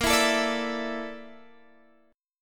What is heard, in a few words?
Bb Major 7th Flat 5th, Guitar, Open G